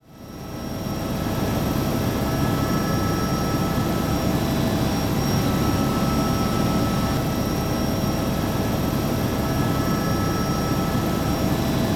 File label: heli